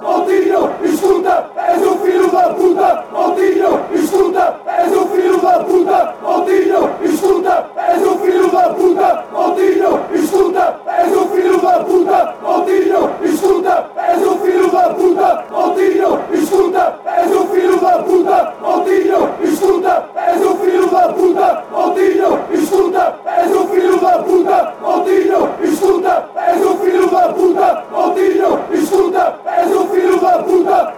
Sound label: chants; moutinho